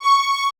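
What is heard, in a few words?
violin quartet F F#C5; AKAI Advance Orchestra Upgrade 97 Vol.1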